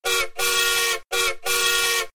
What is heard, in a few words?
train whistle low